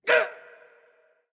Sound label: hitreact human male small